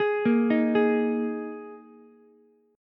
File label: Eb Suspended 4th Ukulele, chord; Ebsus4 arpeggiated; JGuitar